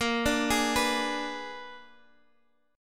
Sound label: A sharp 7th Flat 9th, down, Guitar, Standard; arpeggiated; chord; JGuitar